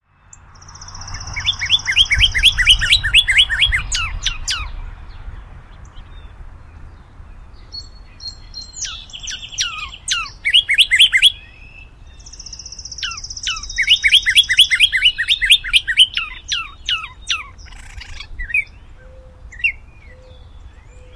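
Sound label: mystery bird with noise removal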